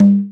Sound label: Conga Low; Drum Machines; Drum Hits; Ableton